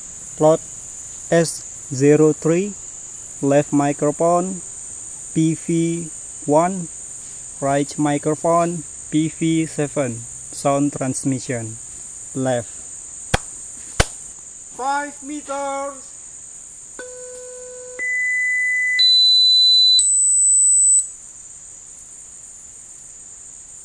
birds

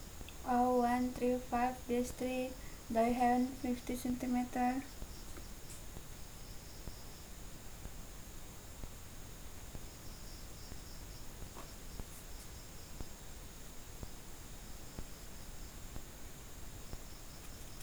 hand, Hipposideros bicolor

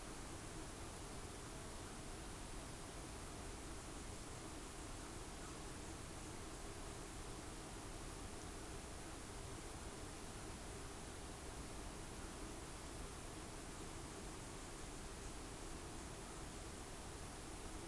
no obvious biophony

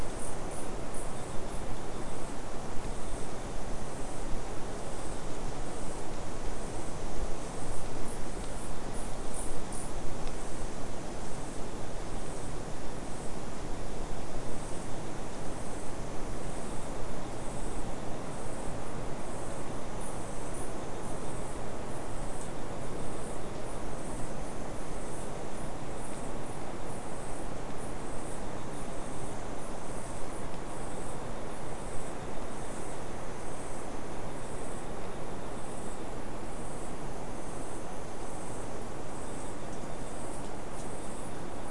mainly insect cluster

